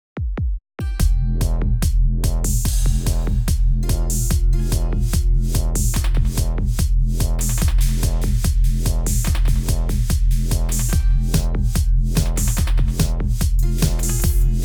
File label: brutal rap techno